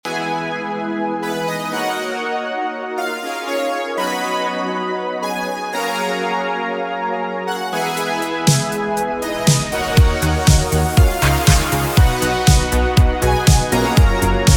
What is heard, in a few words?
Music Loop; tetraq